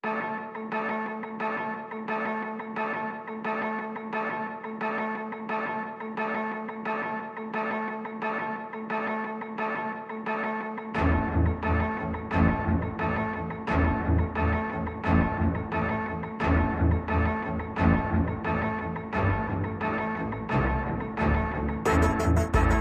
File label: Pads and Pulses Only